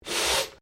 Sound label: MALE SNIFF; Male Vocal sounds; Sick (Sound Effects:Human)